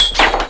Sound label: CRASH AND, CARTOON